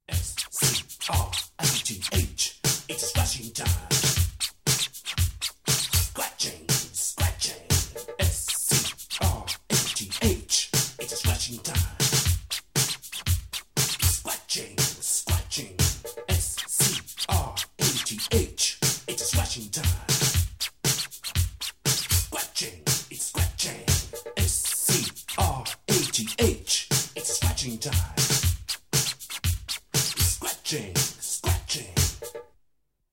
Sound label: Jingle